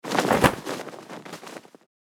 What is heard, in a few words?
Barber cape placement whoosh movement